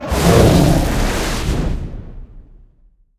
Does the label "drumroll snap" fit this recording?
No